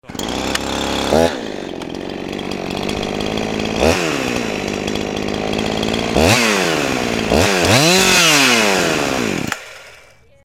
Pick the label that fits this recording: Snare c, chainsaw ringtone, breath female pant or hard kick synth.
chainsaw ringtone